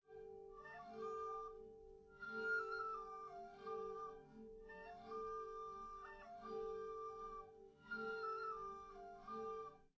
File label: no drums, live rec; SiSEC11; underdetermined